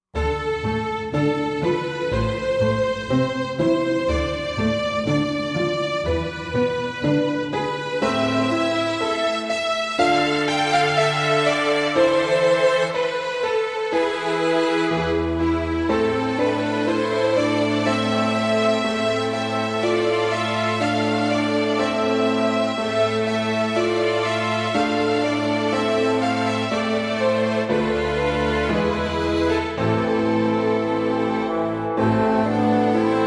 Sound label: song trombone plus